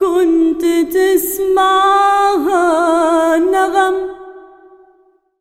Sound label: SINGER A, Arabian Traditions